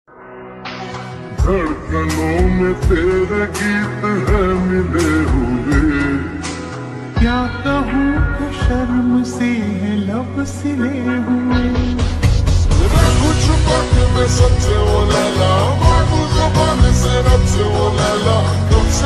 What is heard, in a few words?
x instrumental